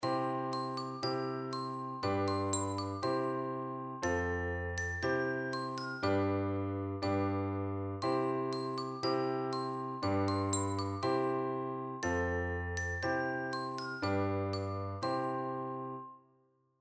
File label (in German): Melodie mit Begleitung